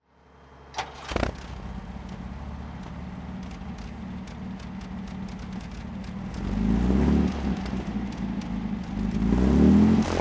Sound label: alvis speed25 dhc throttle